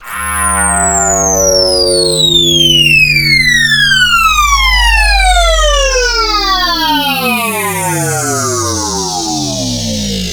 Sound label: faller 150; shimmer; Tensile